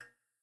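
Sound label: light flicker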